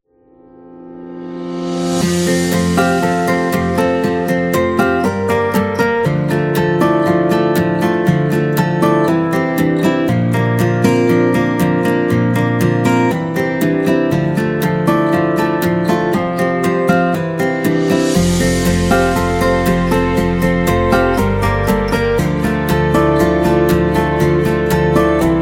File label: Emotional Inspiring